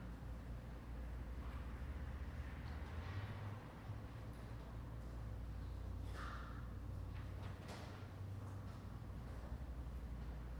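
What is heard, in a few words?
room sounds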